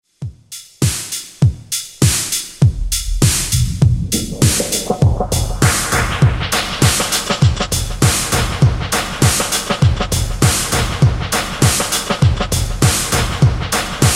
loop sampling

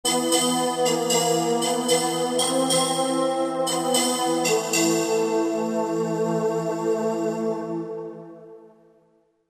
D-50 future pad

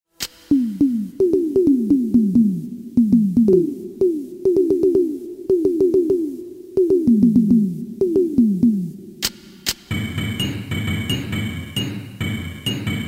Casio SA-1 sfx

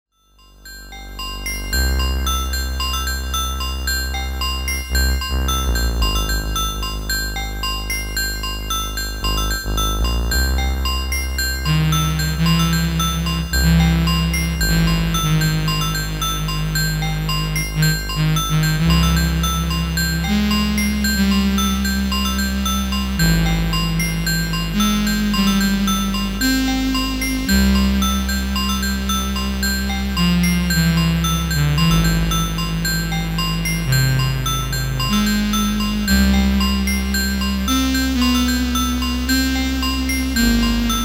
Casio SA-1 child's play

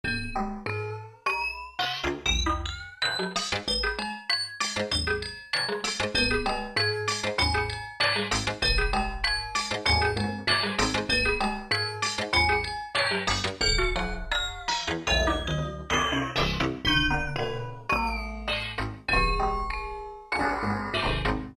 D-50 clockworks

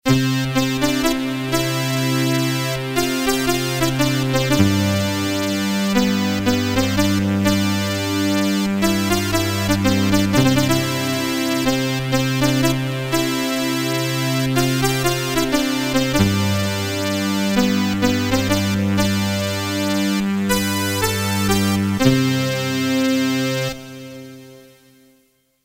Casio SA-1 synth accordion

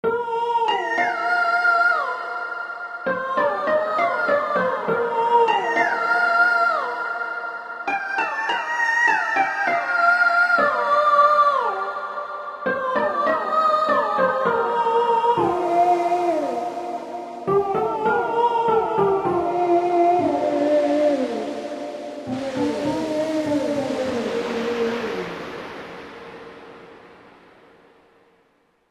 D-50 computer weekend

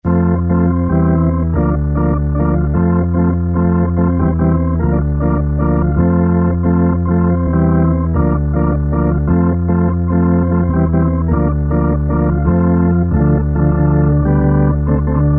Eminent 310 hammond

